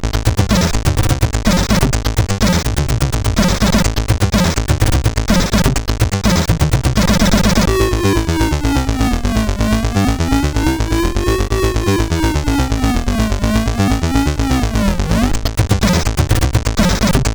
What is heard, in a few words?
sid CSG8580R5